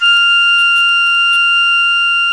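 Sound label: Tone F4, church organ; Best Service; Advanced Media Trax Modern Composer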